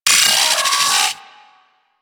Arachnoid Micro Vocal Death; Warframe; Corpus

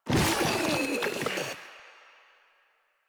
Proto Infested Ancient Attack; Warframe; Ancient Ninety Nine

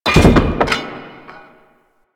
Debris Metal Wood; Warframe; Ambience; Entrati

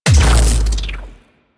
Capital Ship Laser Impact; Warframe; Corpus

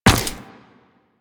Run Ice, Footsteps; Warframe; Tenno

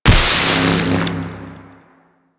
Infested Arc Rifle Fire Shot C; Warframe; Weapons

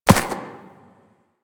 Ram Step Single Grass; Duviri; Animals

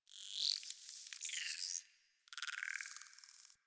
Venus Landscape Close Texture; Ambience; Warframe; Venus Open World

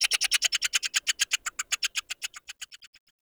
Tree Pine Critter; Ambience; Trees

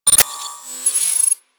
Computers Random F; Warframe; Ambience; Grineer; Grn Computer Room 1

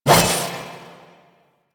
Two Handed Nikana Swing; Warframe; Duviri; Duviri Dax; Weapons

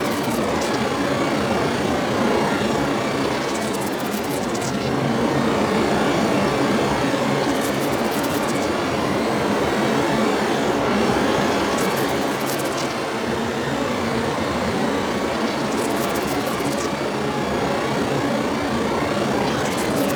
Shades Loop; Enemies; Deco Shades Loop; Warframe; Warframes; Pax Duviricus

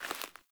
Sandals Walk Vegetation, Footsteps; Characters; Player

